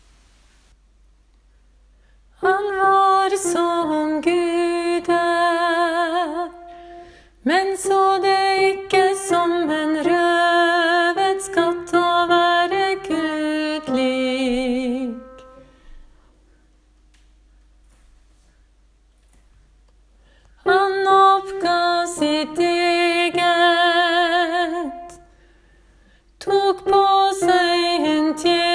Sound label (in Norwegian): kristushymnen alt; åfjord